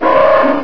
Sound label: baby panther pain; svencoop; custom monsters